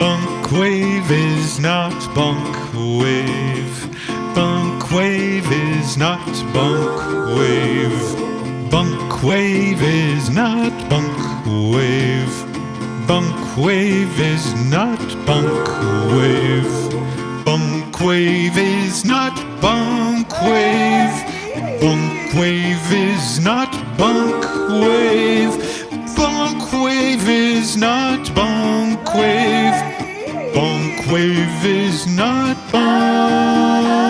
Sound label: Bonkwave Is Not Bonkwave (loop)